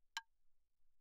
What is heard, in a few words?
clave, hand percussion; claves